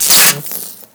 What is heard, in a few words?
jumper cable sparks; Modern Warfare